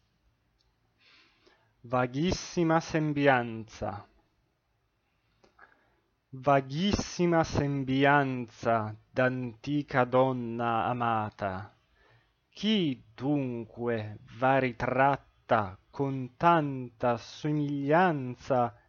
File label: espressivo; Vaghissima sembianza